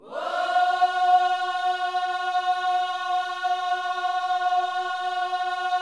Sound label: WHOA F#4, Vocal Planet Gospel; Spectrasonics; Vocal Planet Vol.1 Gospel; akai